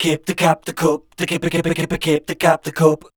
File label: SCAT #D, Voice